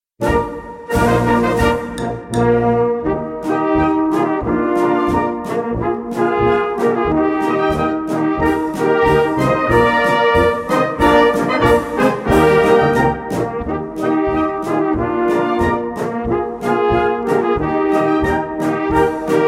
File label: Polka; naeher mein gott zu dir